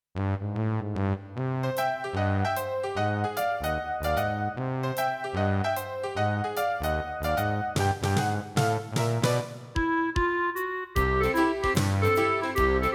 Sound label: CM500 GS; LSL3